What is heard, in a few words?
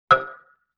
Xylophone Chord C; Vintage Cartoon; Musical Elements